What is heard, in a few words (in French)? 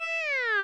mort; Tyra